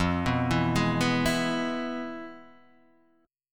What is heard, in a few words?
F Minor Major 7th Flat 5th, chord; FmM7b5 arpeggiated; 1,2,2,1,0,0